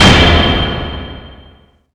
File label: gavel